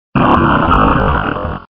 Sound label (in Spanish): Grito de Regirock